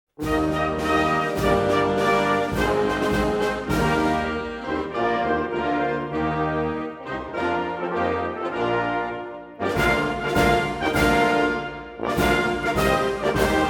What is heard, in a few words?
Senegalese anthem